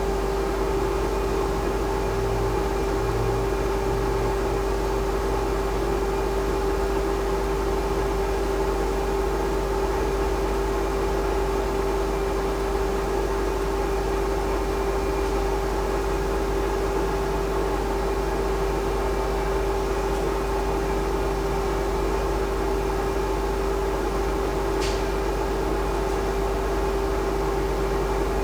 INDUSTRIAL, Ironworks, Oxygen compressors shop, Oxygen compressor; Mononeshot